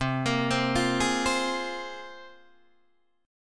C 7th Suspended 4th Sharp 5th, Guitar, Open D

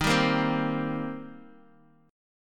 D sharp 6th D sharp Guitar Open D

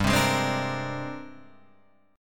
Ab Major Sharp 11th G

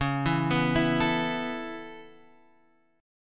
C sharp Minor 7th Sharp 5th, Guitar Standard; arpeggiated; chord; x,4,2,4,5,5; JGuitar

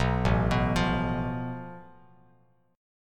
A Suspended 2nd Sharp 5th, Bass Standard; arpeggiated; JGuitar

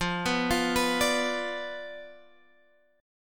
D Minor 6th F, guitar chord

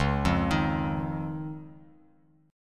Db Major Add 9th, Bass Standard; arpeggiated; JGuitar

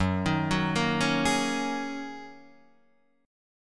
Gb 6th Add 9th Gb down Guitar Open D

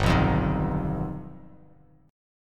G Augmented 7th, Bass Standard; G+7 strummed; 3,2,3,8; JGuitar

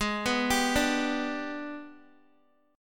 Ab Minor Major 7th Flat 5th, Guitar; arpeggiated; guitar chord; x,11,11,12,14,x; JGuitar

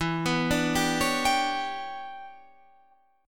E Minor 13th guitar chord, Half Step Down

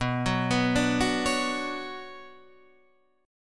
B Minor Add 9th B, chord; Bmadd9 arpeggiated; madd9:1,b3,(5),9; JGuitar

